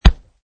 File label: Chest Punch; Martial arts - chest punch; Punch And Hit Sound Effects; Punches and Hits; Combat